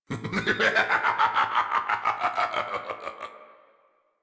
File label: laugh; Warframe; Tyl Regor Boss; Efforts